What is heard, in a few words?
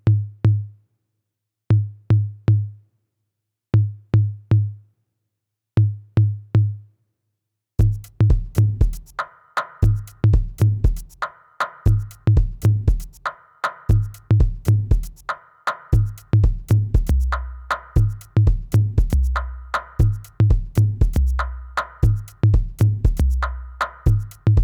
electro 118bpm